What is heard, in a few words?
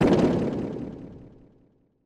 slam